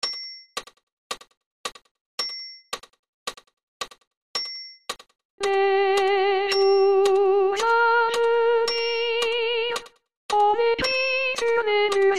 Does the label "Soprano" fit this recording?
Yes